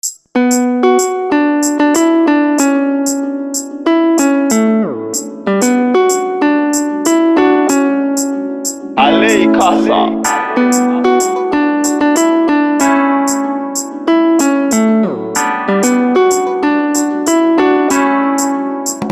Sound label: Instrumental